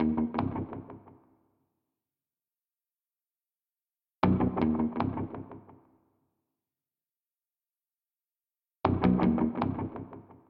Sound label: Fight Background; We Happy Few